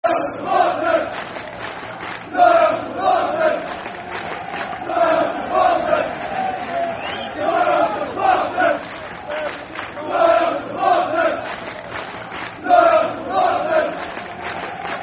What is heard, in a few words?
Football Chant (Soccer Song); Loyal Supporters; Aldershot Town Afc